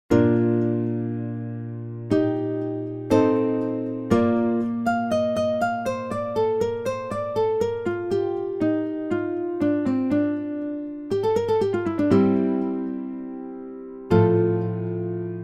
Gitarren-Piano-Gemisch

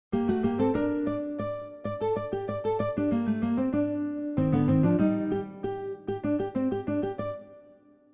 Gitarren Djingle

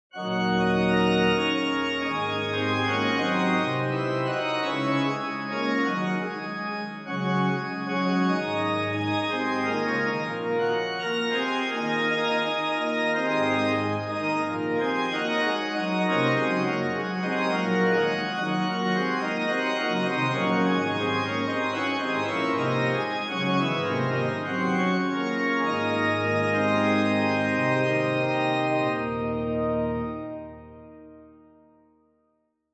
Orgel Jodler nach Brahms

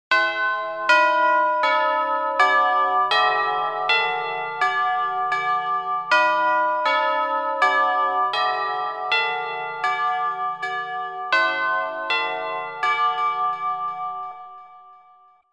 grosses Glockenspiel

